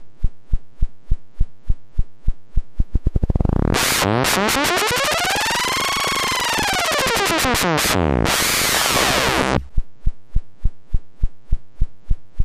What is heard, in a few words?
engine rev up down; engines